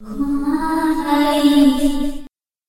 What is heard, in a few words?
monster siren